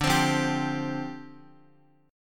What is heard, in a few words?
D Minor 11th D, Guitar; Dm11 strummed; JGuitar